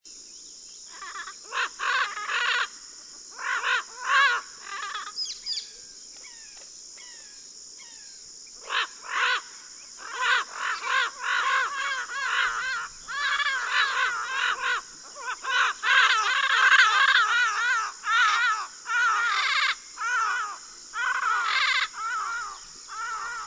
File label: Amazona oratrix